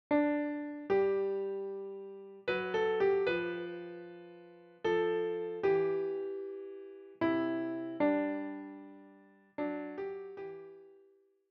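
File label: piano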